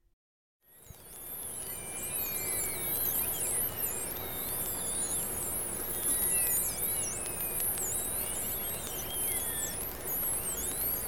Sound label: long finned pilot whales; vogelstimmen